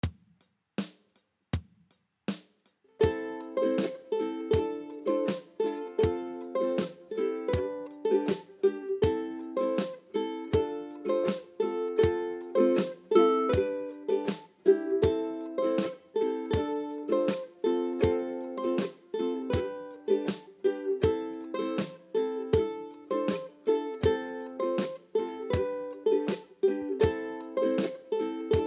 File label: 80 BPM, uku